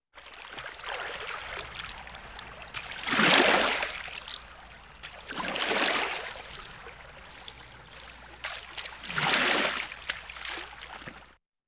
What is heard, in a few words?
Sea Ship